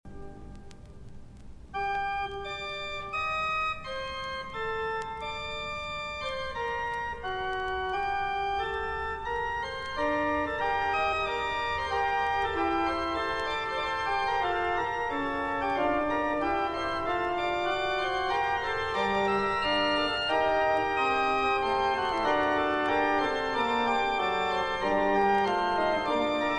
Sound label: Fugue; Prelude and Fugue in G minor